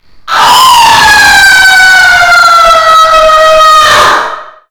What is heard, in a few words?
Scream